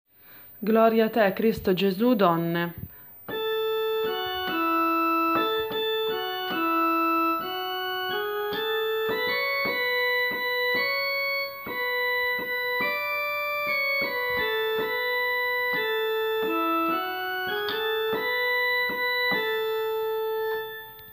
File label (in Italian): ritornello donne